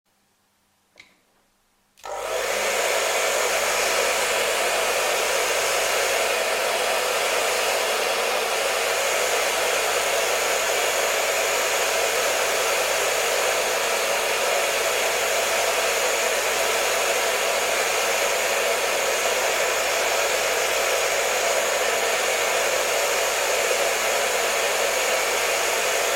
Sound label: Hair Dryer Sleep Sounds White Noise; for babies; Stop Baby Crying